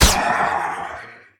boss hit; spider-man-mysterio-rush-game

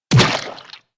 blood impact; Cat Adventurer

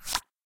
move good; YummiCookie

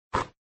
net swish, basketball; inlogic-basketballmaster

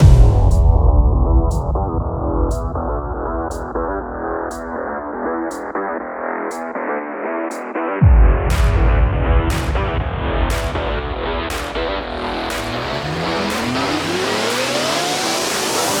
Music Menu; Rally Champ

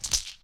chips, blackjack; inlogic-blackjackmaster

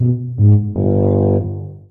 game over screen; acme rocket dash

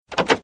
chest open; pharaohslotscasino

